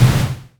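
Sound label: Pink Noise; Roland SH-32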